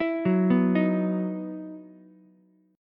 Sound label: E Major 7th Suspended 2nd E down Ukulele; arpeggiated; JGuitar